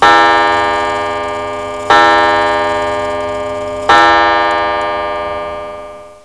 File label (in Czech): pulove biti mechanicke